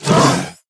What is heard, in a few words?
Spider Grunt General Scream Start Combined